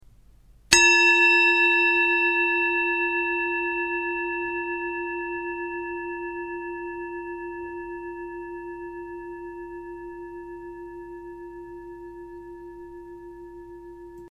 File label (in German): Klangschale; 521g